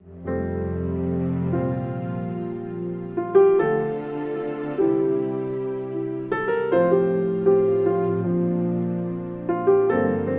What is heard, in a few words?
With Strings; 30sec